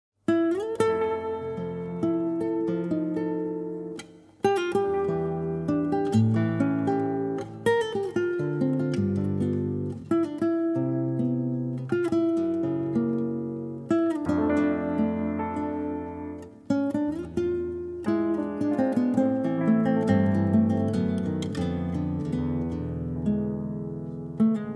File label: flute